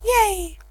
"yay"

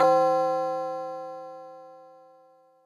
bell

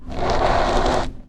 scrape

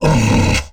hurt, aliens; ortnok

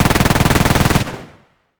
bullet smg; ufoai; weapons

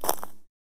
grass, footsteps

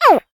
baby croc

